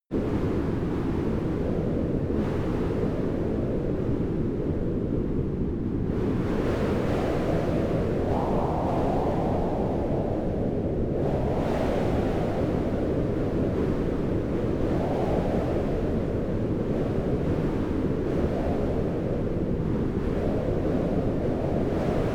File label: wind cold exterior